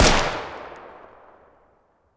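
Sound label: fire distant 300blk rifle exterior; gmod; arccw uc commons attachments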